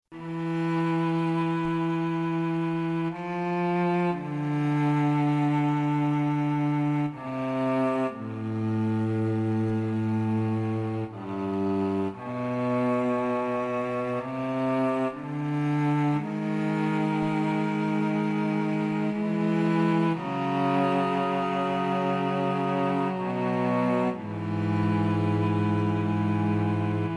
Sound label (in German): streicher